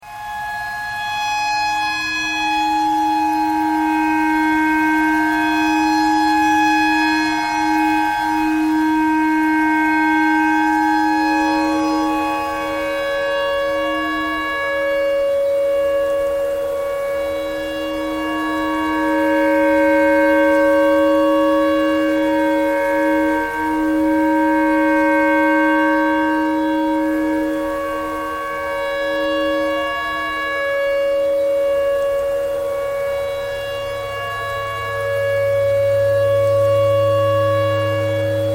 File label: clarinet tornado siren thread pentatonic